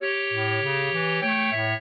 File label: clarinet; Mozart